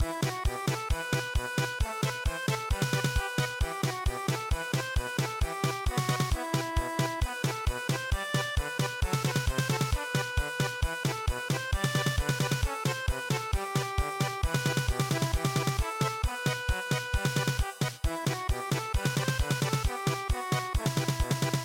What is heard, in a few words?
Spanish Dance German Polka